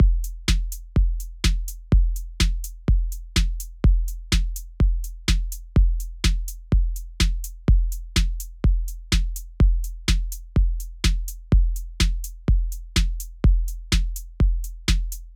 Four on the floor 125BPM

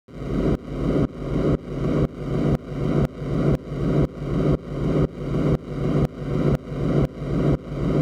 inline sidechain whooshing

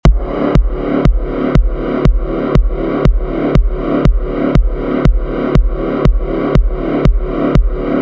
inline sidechain; moscow

